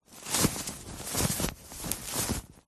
foley luggage thin fabric bag movement handling short